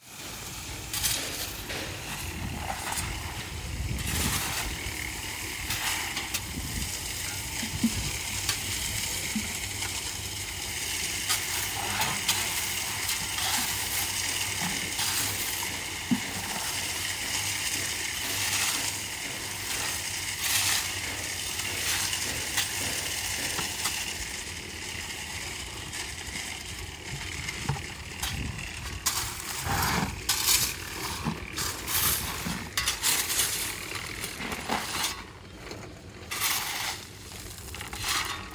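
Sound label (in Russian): бетономешалка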